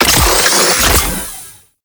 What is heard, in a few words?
Med Station Activate; Warframe; Lasrian Soldiers; Weapons